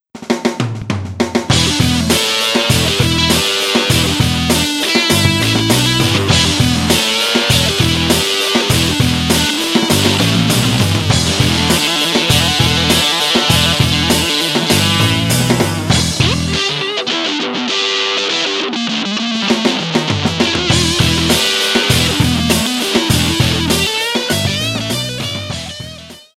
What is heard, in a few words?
patch demo